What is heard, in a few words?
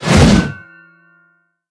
swing metal